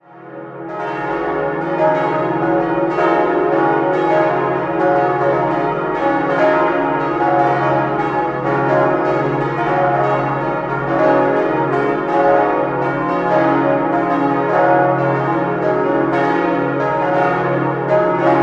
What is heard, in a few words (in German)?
Nürnberg Langwasser, Evang. Paul-Gerhardt-Kirche, Glocken